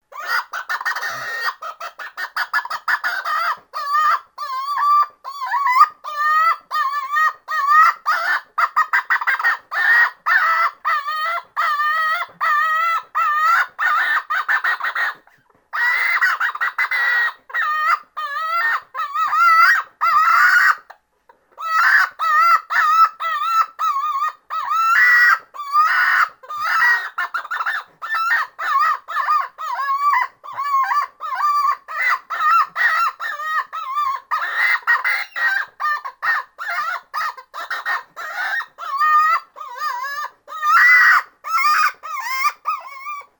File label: Chicken distress